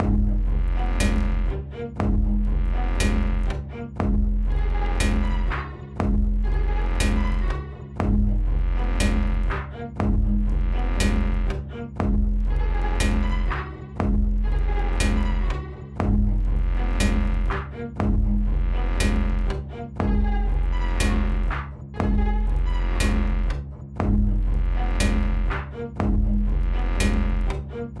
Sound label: Loop 120bpm